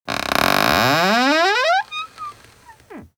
Skrzypienie Drzwi, creaking door

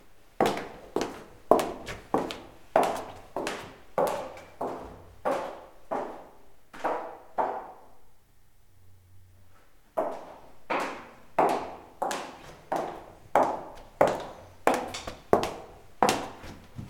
Kroki W Szpilkach, footsteps heels

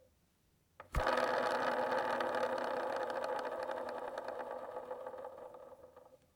Rolki Krecenie, rollers spinning

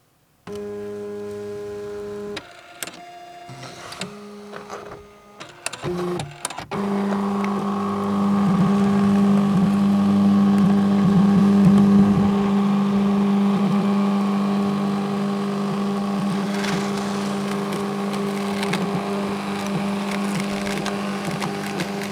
Fax Pusty

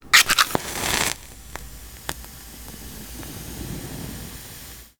Zapalka, match

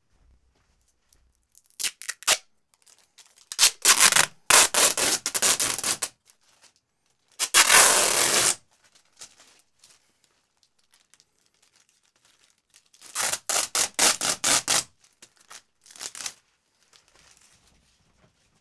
Tasma Klejaca Szeroka, tape adhesive

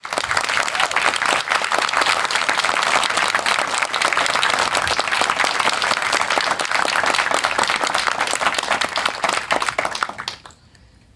Oklaski

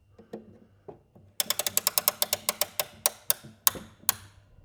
Nakrecanie Zegara, winding clock

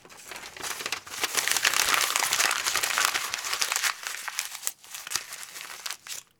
Papier Gniecenie, paper crumpling

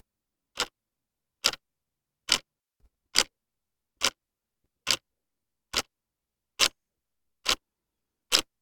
Zegar Tykanie, clock ticking

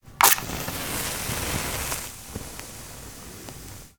Zapalka, match

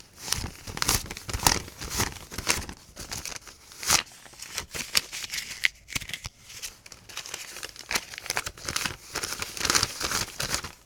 Banknoty Wkladanie, cash inserting

